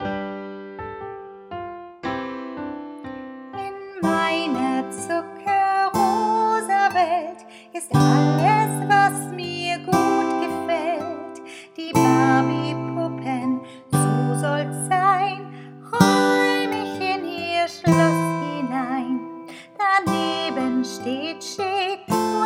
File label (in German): Stella Lied Gesang